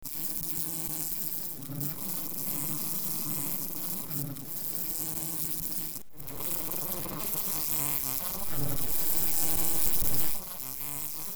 flies

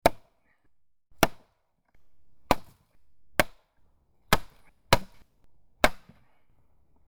axe

